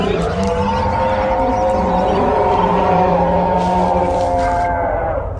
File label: abom scream